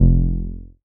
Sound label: bass F1, Oberheim OB-Xa